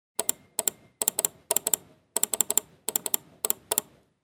klik myshi zvuk

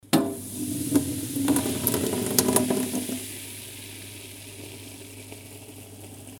zvuk gazirovki

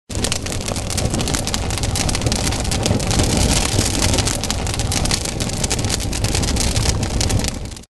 zvuk kostra

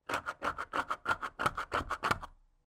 skrebushhie zvuki

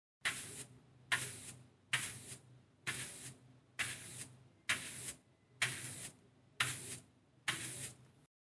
zvuk shhetki

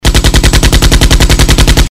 zvuk vystrela

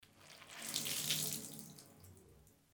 vyzhimanie

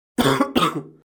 zvuk kashlja

